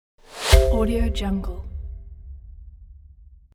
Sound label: swoosh